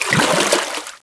WATER MOVEMENT

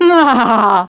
LAUGH; battlesnds

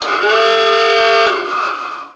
ALARM FAN

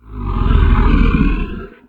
Zombie Raise

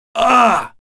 hit; BattleSNDS

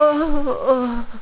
DYING; BattleSNDS

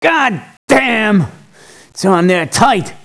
locked; BattleSNDS

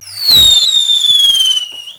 MORTAR WHISTLE

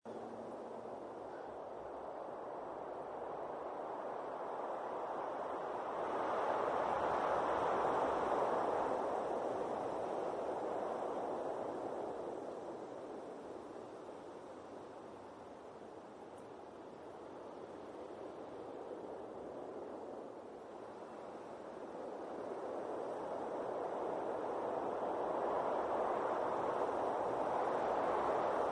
Wind loop

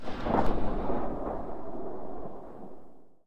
echo town

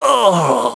DYING; BattleSNDS

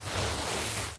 Drag Body

